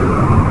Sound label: Air Elemental Move Sound; Heroes3